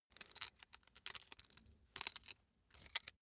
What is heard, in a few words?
small metal handling scrunch; industrie wirtschaft; metall